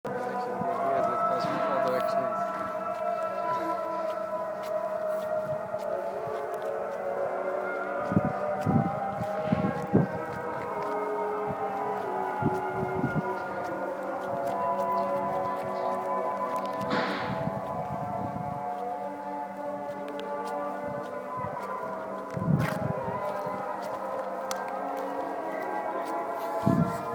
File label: Hebron Mosques